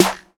Clicky Acoustic Snare Sample F# Key, snare one shot tuned to the F# note